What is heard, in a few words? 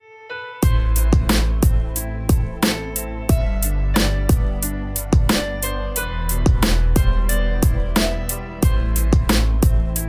Piano Relax